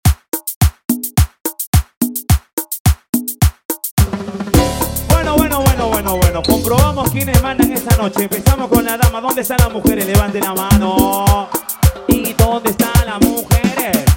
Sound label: show 107bpm